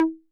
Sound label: Conga Mid 808 Saturated B